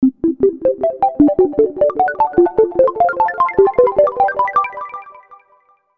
Musical Twinkle; Soft Delay Reverb Arpeggio Ascend; Miscellaneous Musical; Blastwave

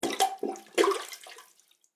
Household Toilet Plunger, bathroom; SFX Bible 6

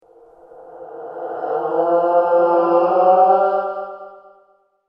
Groan From The Crypt; Ghost Sound Effects; horror sound effect

